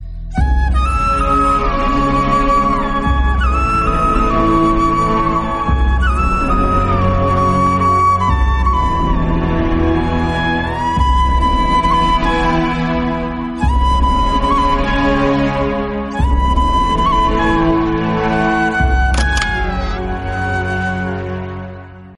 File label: heart touching flute instrumental ringtone